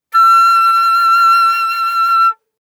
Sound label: Flute vib ff E6 stereo; Woodwinds